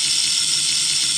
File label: machine, loops; cc0 sfx loops